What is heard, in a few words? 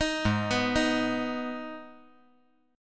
G sharp Minor G sharp down, chord